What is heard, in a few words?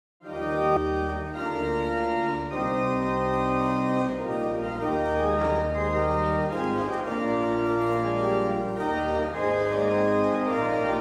festival march